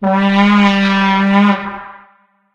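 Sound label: vuvuzela; video games; Source Engine; tf; football manager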